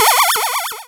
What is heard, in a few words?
Cry